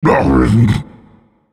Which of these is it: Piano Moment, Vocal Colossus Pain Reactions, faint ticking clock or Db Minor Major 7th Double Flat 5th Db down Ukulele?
Vocal Colossus Pain Reactions